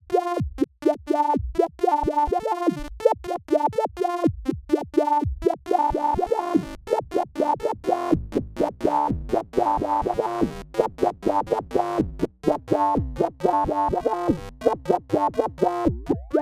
Rossum Electro Music Linnaeus Filter Response FM Mod